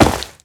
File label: Step Grass Layer